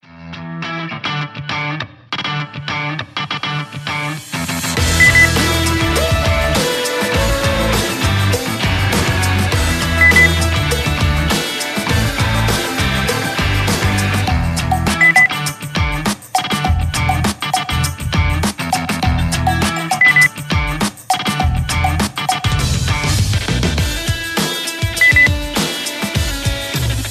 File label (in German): route 66 beep